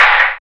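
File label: snare drum; E-Lab; X Static Goldmine 1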